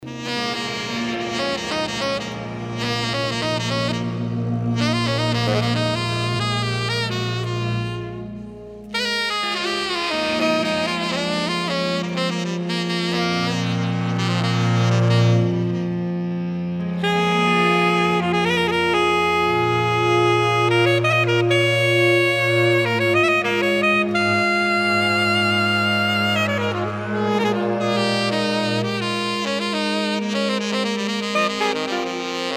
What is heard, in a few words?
Cool Feedback Soul overdrive